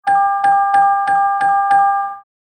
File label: graph